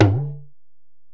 Ac Perc, Drum Machines Alesis DM-PRO

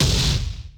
kit, Yamaha Motif-ES8

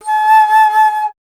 Alto Flute, Solo Instruments

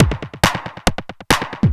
percussion loops, 138, Techno

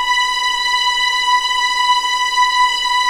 B5 EXP MF, VIOLINS; East West; The Ultimate String Collection

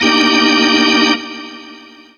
Hammond; Keys

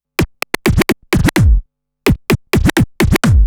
Scratch Fills 140BPM; Vengeance - Essential Clubsounds Vol.4; Scratches

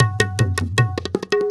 INDIA L, Heart of Asia, IND ENS; Spectrasonics - Heart of Asia CD2

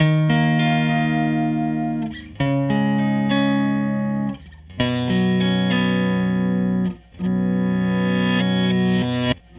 Strat Guitar Loop

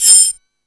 Multi FX, Drum Machines, E-MU Pro-Cussion

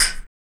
RSS PERC

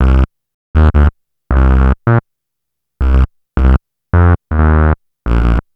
Bass, Futurist Drum & Bass

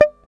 GUI MUTE, E-GUI MUTED

